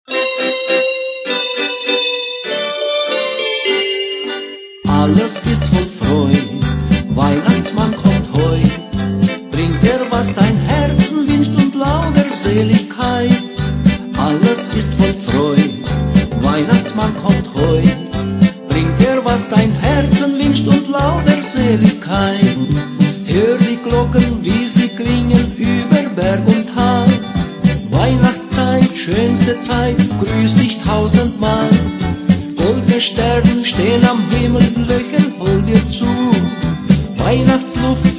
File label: jingle bells